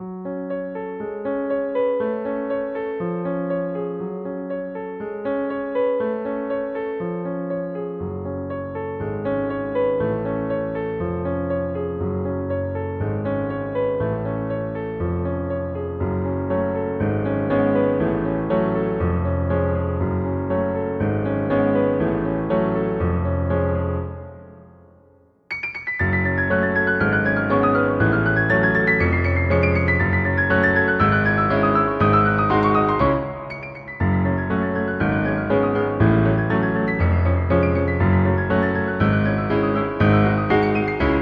Turkish March, Underscore

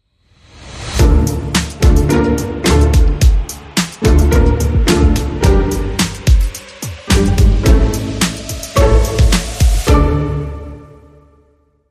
Hybrid Sting

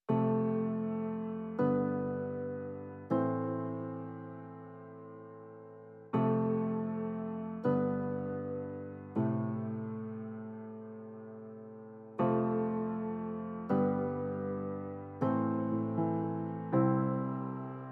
Broken Hearts, Reduced Percussion

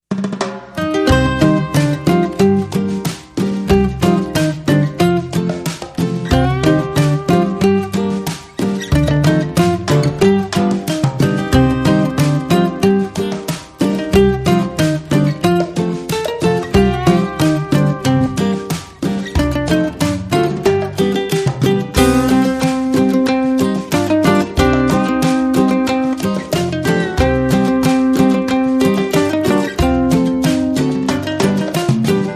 Acoustic

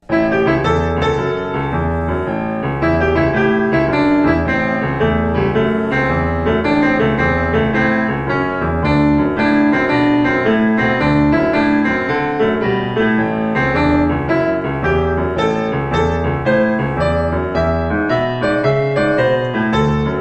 Blues

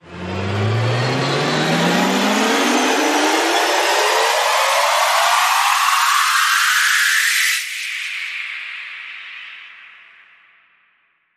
Rise Short

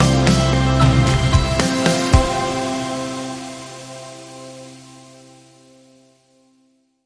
Bumper

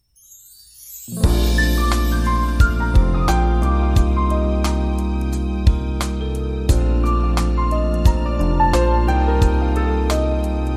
Warm Piano

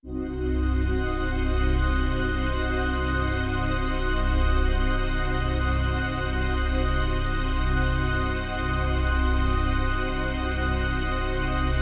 Just a Diminished Chord

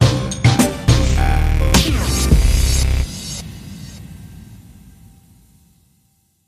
G Strings, Sting

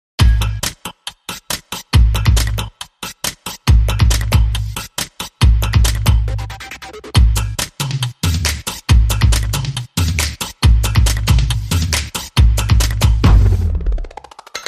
Drum Up The Beat

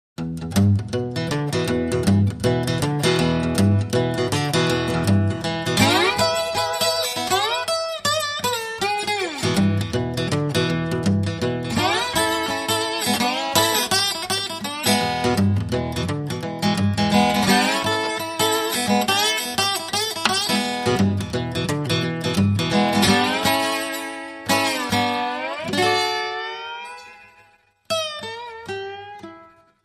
Bottleneck Solo

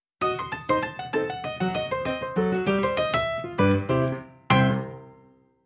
Rag Man Long Sting

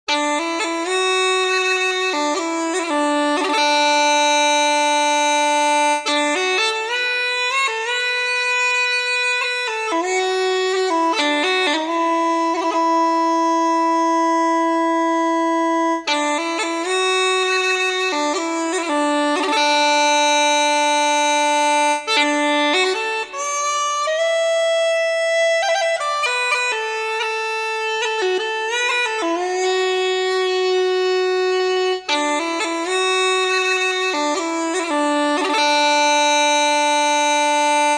Irish Uilleann

